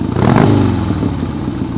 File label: car idle; Interactive Media Design Review 1999